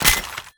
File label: ammo catch; smite; zhongkui